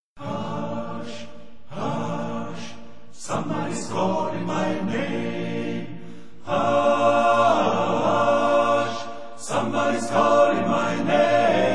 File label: Hush